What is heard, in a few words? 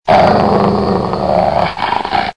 grrr